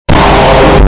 vine auf

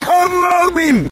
reversed; mibombo

